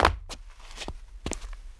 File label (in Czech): z auta vysed; Mafie 1